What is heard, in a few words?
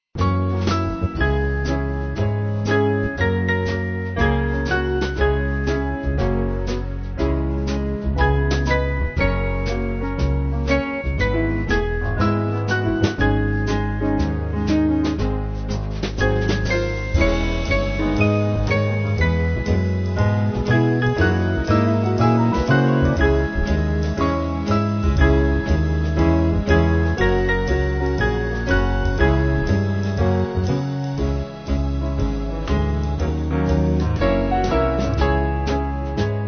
Band